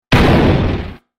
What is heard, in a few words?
knock